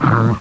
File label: shock trooper pain